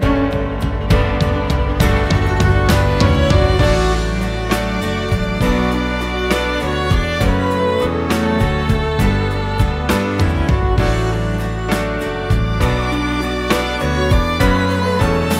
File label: Instrumental